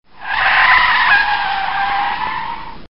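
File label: sounds of car brakes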